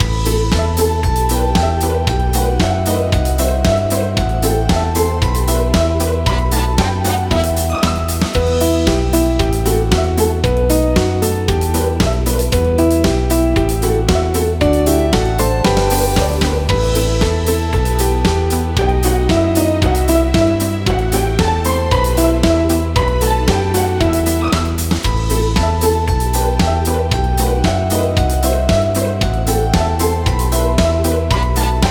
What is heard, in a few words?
karaoke